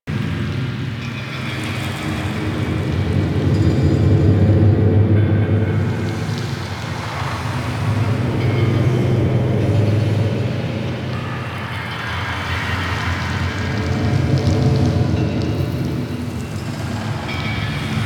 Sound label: Fortress Interior Amb